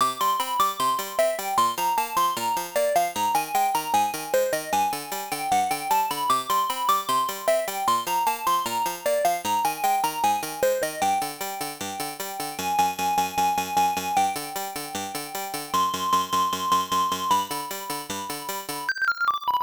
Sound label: BGM; Dragon Buster; X68000; Calcareous Cave